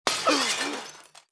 Train Station Window; pick N Click Scene; netent

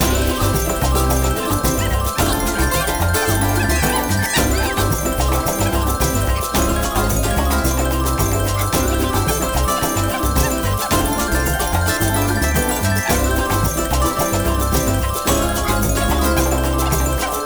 Big win

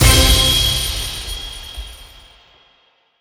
big Win End; netent

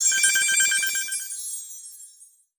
Goldrain Start; netent

